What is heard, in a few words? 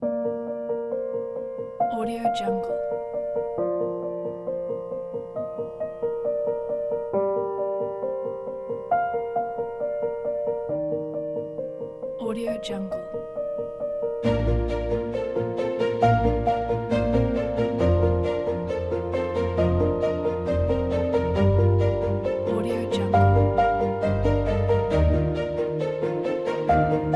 piano inspiring pack